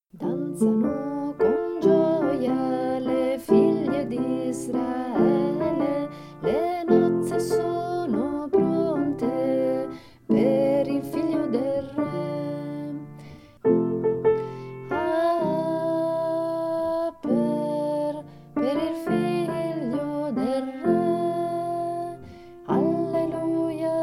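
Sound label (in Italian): Nozze A Cana, contralti ritornello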